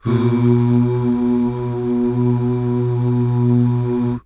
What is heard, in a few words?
UUH